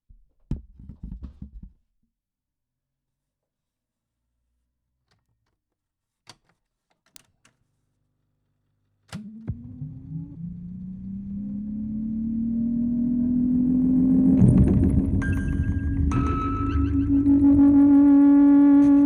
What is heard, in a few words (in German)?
Aufnahme Tape Grundig 19 cm (Lautsprecher Tonband), 2 Spieluhren; Abspielen der Aufnahme vom 18.7. 'sand' auf 2 Spieluhren mit Tape; rauschpartikel